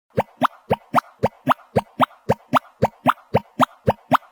kawaii

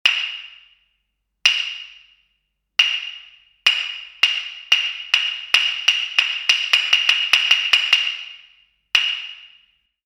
hyoshigi

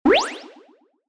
hyokkori

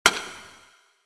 dakyu bat

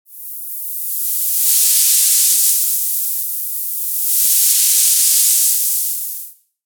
hebi shaa

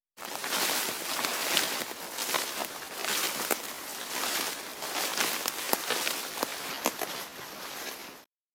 kusamura

